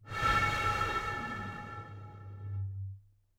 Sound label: Bottle Blown